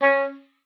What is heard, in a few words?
C#4, OBOE; Xsample VOL-6